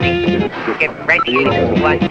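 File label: rhythm loops, 114 bpm; Rarefaction - Poke in The Ear With A Sharp Stick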